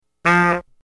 clown bad roll; Dice World

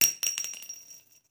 Bullet Shell; Sound Ideas; Guns

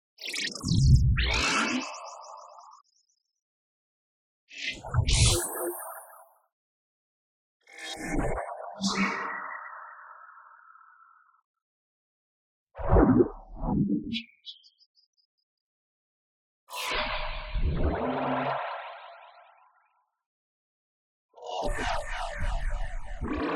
tonal, magic clouds; boom library; Anime Essentials

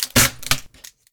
crack; Apotheorasis

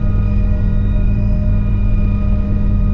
Space Capsule Idle Loop; SFX Kit; Sci-Fi Loops

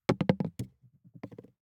DICE on Glass, Throw and Roll, Foam, 1 One Die; Samples; ArticulatedSounds; DICE Sfx Party (Extended)

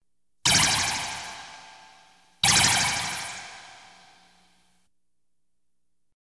Science Fiction effects, Lasers, Light beams

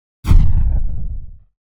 Cannon Fire Deep Firing - Short; Hollywood Edge; sound designer's toolkit v2

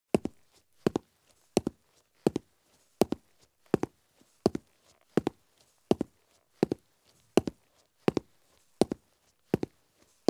Army Boots Walk at Fast Pace on Concrete; Sound Ideas; Footstep Sound Effects

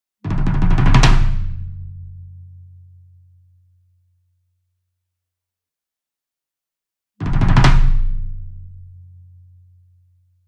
Bass Drum Marching Roll Hard Beater Near; SINGLE DRUM; boom library; Cinematic Strikes Construction Kit

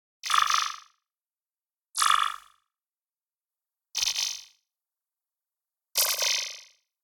Generic Click Digital

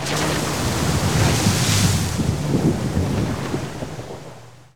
Auto Tires Splash Water; Automobiles

